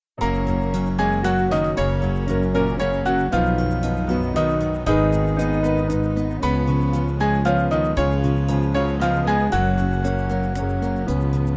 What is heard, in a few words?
Band